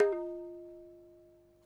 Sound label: hi hit, tabla; Dirt-Samples